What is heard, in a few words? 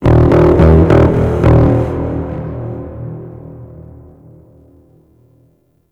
Stage Bass Guitar; Warframe; Ambience; Nineteen Ninety Nine; Obj Temple Defense